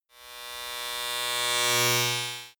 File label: servo small whoosh